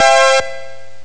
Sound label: power maj; ST-XX Amiga Soundtracker Sample Packs